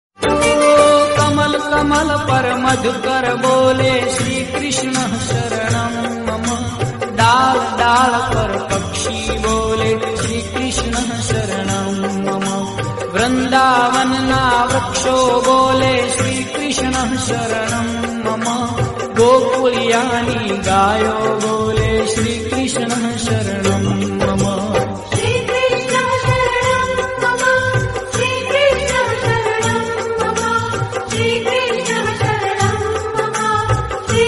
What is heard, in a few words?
MALE RINGTONE